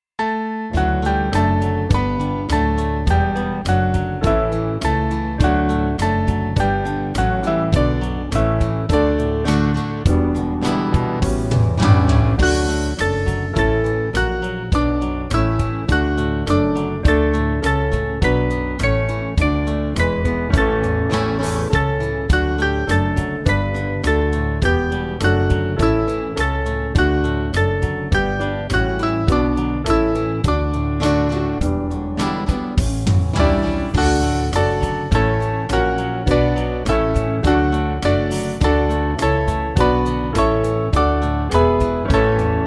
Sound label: Band; Come Holy Ghost With God